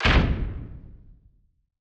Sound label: slam big perc